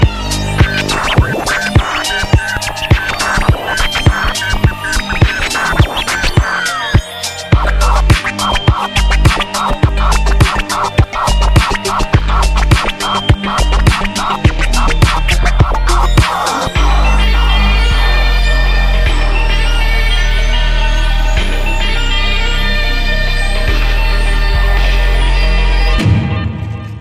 Cool Scratch

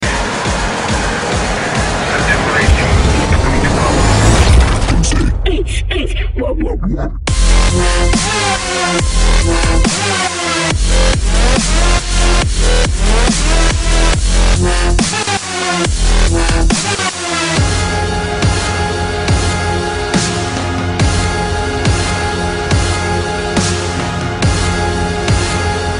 Unce Unce Wub

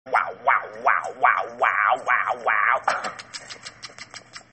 UNHhhh; Katya

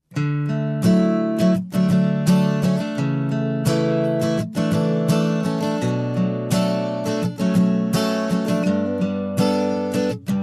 Guitar Solo nice

